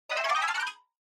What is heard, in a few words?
Scene Change Long Dry